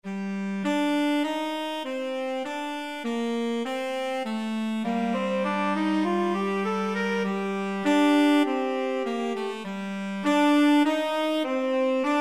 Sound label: 2 tenor sax; tenor sax duet